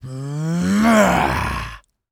Hand-to-Hand Combat - Vocal Excursion - Male - Powering Up; The Chris Alan; Vocal Excursions